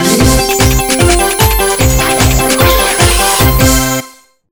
Carnival Music; SEGA; Sonic Riders

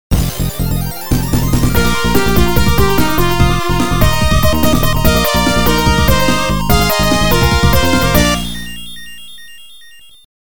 Fanfare; Sonic the Hedgehog 3 & Knuckles Original Soundtrack

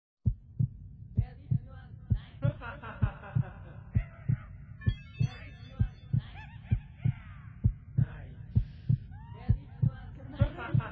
Ambient Trance Mix

